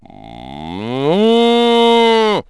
cow; Wired for Sound Pro CD; Aristosoft, Inc.

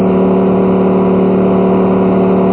ENGINE; WRECKAGE

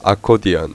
accord; My Animated Word Book; band

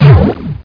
ball crush; delirium

